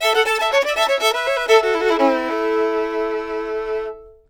FIDDLE; Sampleheads; Whole Lotta Country 1 & 2

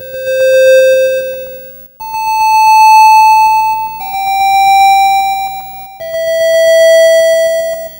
Atari 2600 Synth; 8-Bit; Chiptune

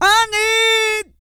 SING, GOSPEL; Vocal Planet Vol1 Gospel

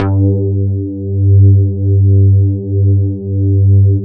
SYNBASS

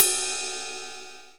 RIDE CYMBAL; Greytsounds; Scott Peers Sound Engineering

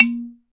BALA, Balaphone

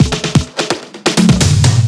Fills 128BPM, Clubsounds

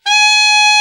BRA ALT, SAX; Best Service Gigapack 1

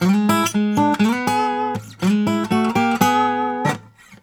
Blues Guitar; ILIO; Fretworks; LICK

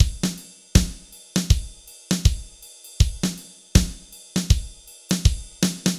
BPM 80 AMB

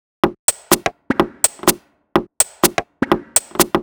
125bpm, House Drumloops

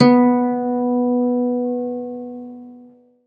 ZITHER, Alpine Volksmusik; Best Service

